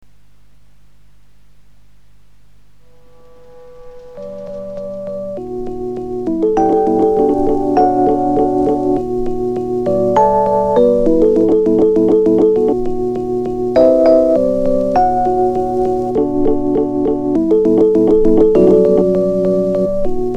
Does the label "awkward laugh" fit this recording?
No